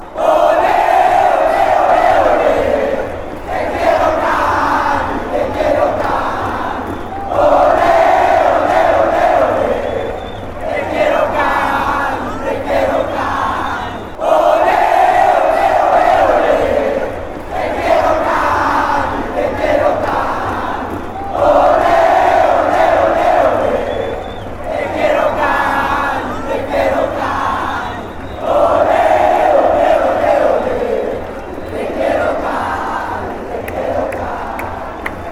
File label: deportivo cali chant